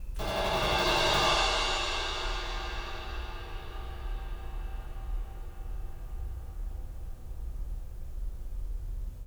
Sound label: roll cymbal